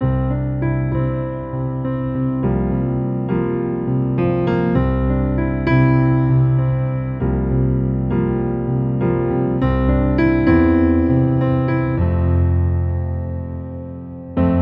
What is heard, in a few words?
Piano Mood One